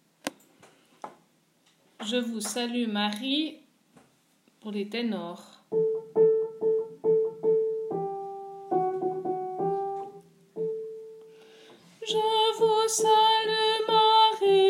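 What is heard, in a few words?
Je vous salue Marie ténor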